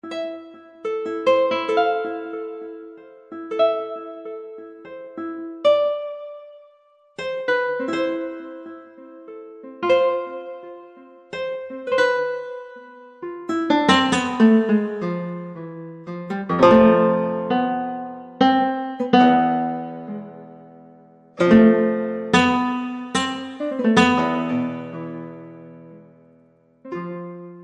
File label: nylon fleta guitar full